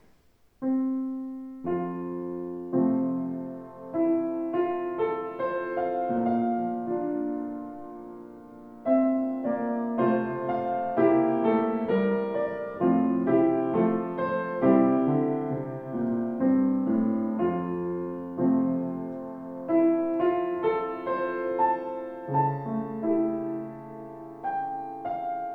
Feurich

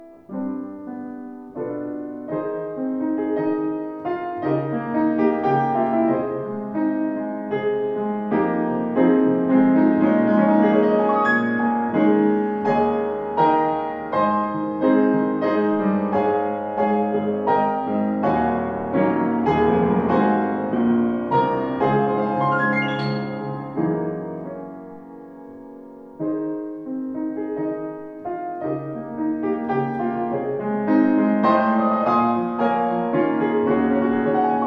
Feurich; 116 feurich

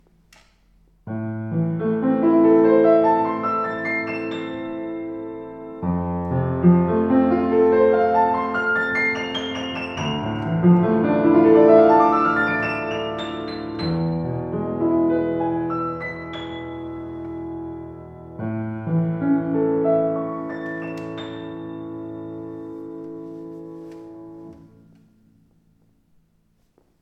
Ibach; ibach 115

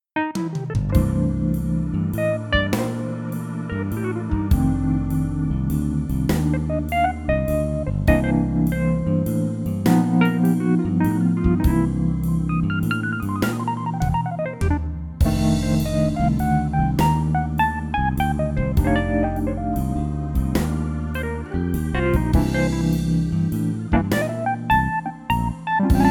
ES 920

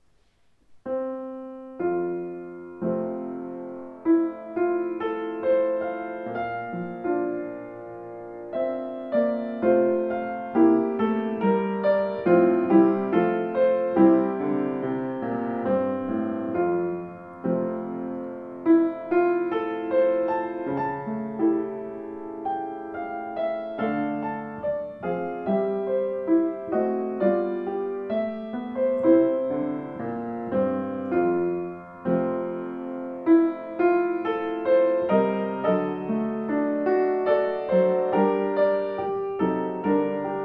Schimmel

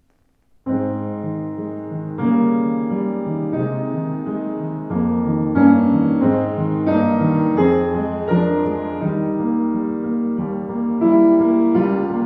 Ibach; ibach 115

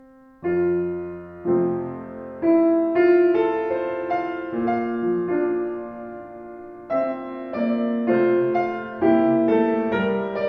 Schimmel; 112 schimmel nussbaum

